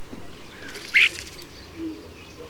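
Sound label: common starling vulgaris, flight call; doelln-see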